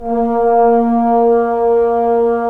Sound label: BRS Tbns; Roland - LA Composer vol. 4